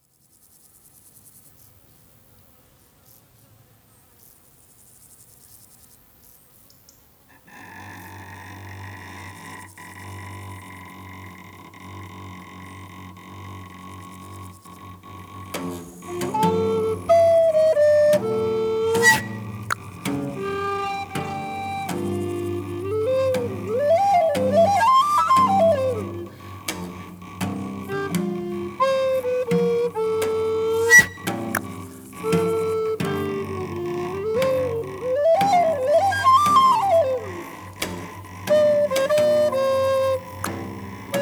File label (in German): Hexenmusik